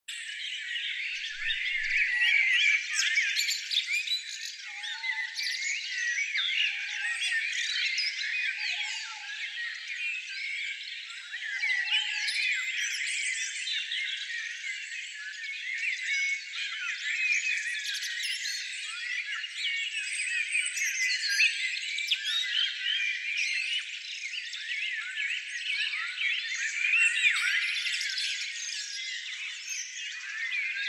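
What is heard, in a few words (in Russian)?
звук природы и пение птиц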